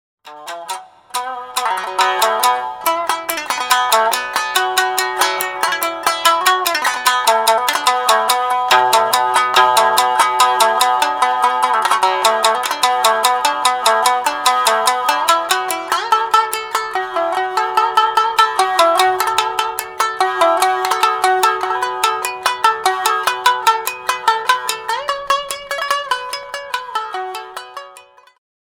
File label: shamisen solo